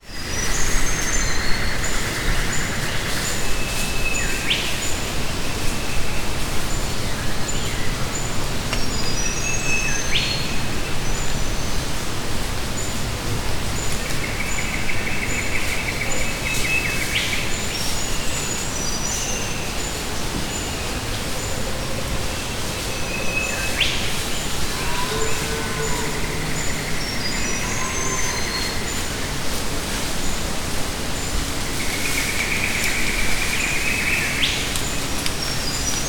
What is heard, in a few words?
rainforest subtropical australia scrub turkey scratches for food wind tree; birds; scratches for food wind trees birds; zapsplat